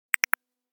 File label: involved; notification sounds